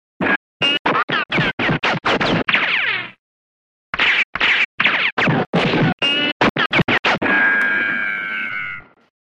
rapid ricochet; Grant Evans

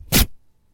Waxing Eyebrows; Mike Koenig

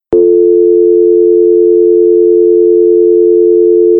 america dial tone; daniel simon

